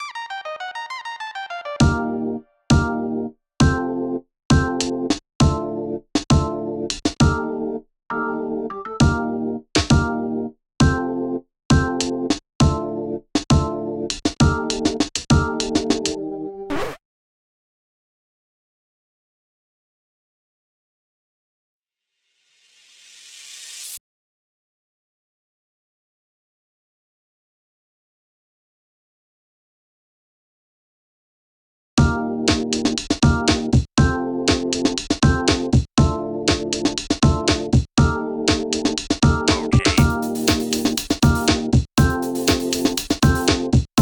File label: Instrumental; Sassy Magic; Friday Night Funkin' Magic Master Engine OST mod Windows gamerip 2023